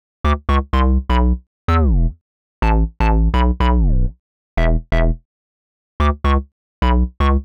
Hard Synth Bass E, 125; PowerFX - Nu Jazz House

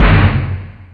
Stadium Rox, Drum Machines, E-MU Pro-Cussion

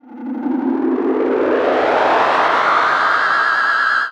Tape Delay Feedback; radiosonics

